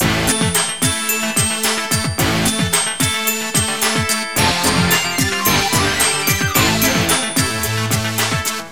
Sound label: 3D beat